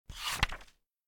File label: flip sound